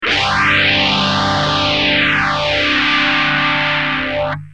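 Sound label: guitar boss ph1r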